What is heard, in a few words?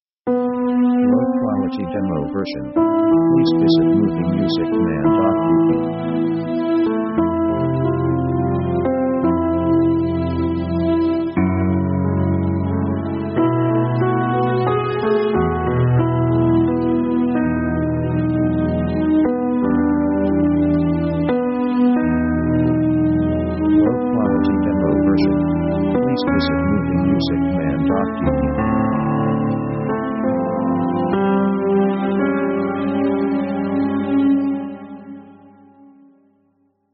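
orchestral melodramatic sketch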